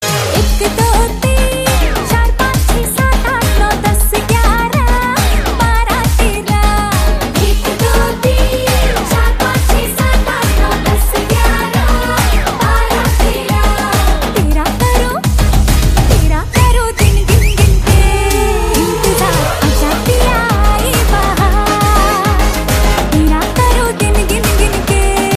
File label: hindi song ringtone